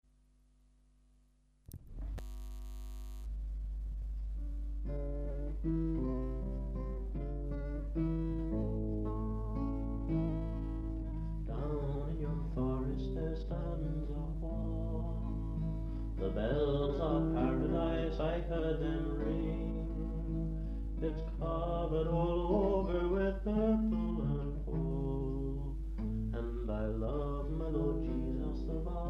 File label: down in yon forest